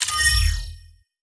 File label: ui strategy